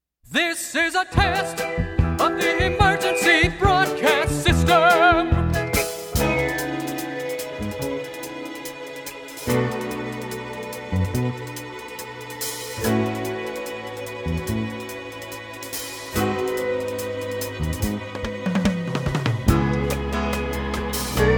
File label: Singing; EBS Test Bed and Close